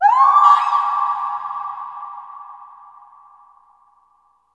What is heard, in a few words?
BERBER YEL, FEM. NOISE; Spectrasonics; Heart of Africa